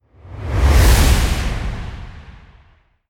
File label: Airy Whoosh Soft Wind